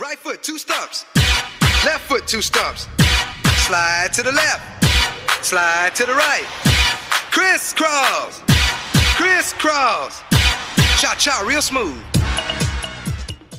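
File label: English Ringtone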